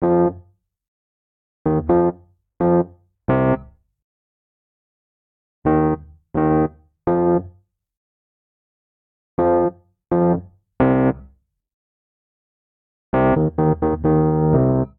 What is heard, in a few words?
Vamp Dub F Minor 64 bpm, Electric Keyboards; Ableton; Factory Packs